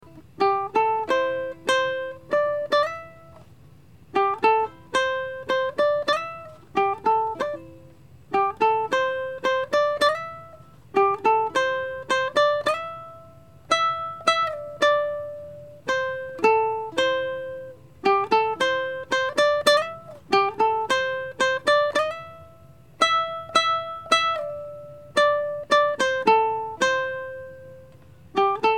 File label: Noodling Uke